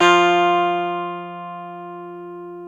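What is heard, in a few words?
guitar electric 12 string; Club-50 - Foundations Roland; E.12 String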